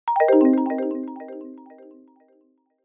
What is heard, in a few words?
multimedia alert system